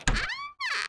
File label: door open; FEAR